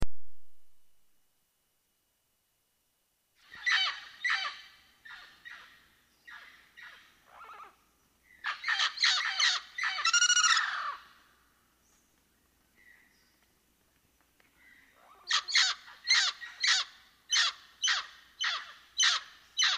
Mealy Parrot Amazona farinosa

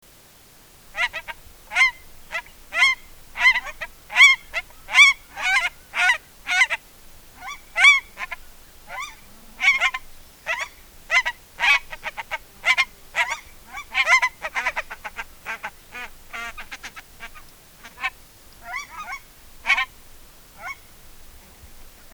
hawaiian goose

